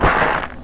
farming tree far; Bolo

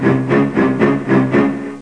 jaws

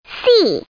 SEA

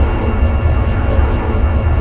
amb sector; mission sound

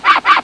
Squaker; Yipe! III; Monsters

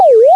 Recycle; Factory

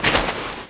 Step; trex

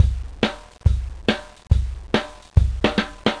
drums; Sonic Foundry; Classic Country

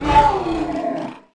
Close Program; Unreal

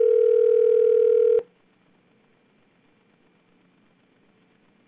ringback; Gizmo Project 4.0.4

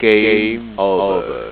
gameover; Blocker1.2

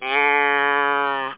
Cow; NOVA - For the NeXT Workstation; Bessie

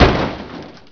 COLLIS, Prangs

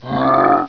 goblin hit; Ferazel's Wand Demo

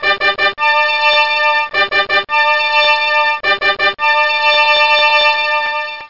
Trumpets; Buffalo 1.0

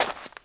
step; wob2 demo

